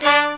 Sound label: Horns; Sound Library 2000; Wayzata Technology